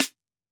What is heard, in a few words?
hiphop snare, Effect on